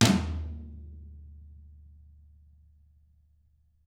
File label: DW 14 FLAM, Double Platinum Drums; ILIO